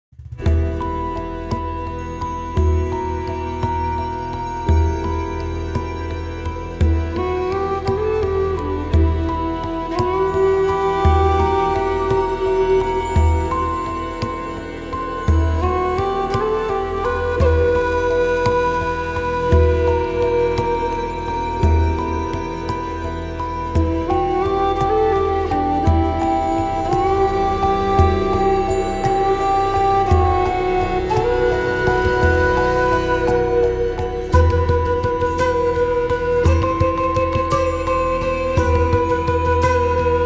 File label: calm suspense